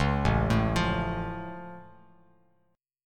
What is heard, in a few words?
A Augmented A down Bass Standard; arpeggiated; JGuitar